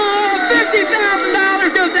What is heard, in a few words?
win